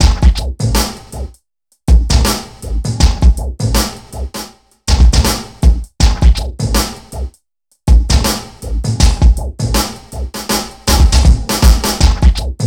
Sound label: HIPHOP, Funky Xtreams; INIS